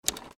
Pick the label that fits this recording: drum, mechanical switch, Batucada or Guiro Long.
mechanical switch